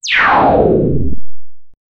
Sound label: dash sound rpg game